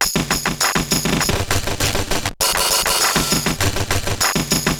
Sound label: Bent RX17 (100BPM), cyborg beats; musicradar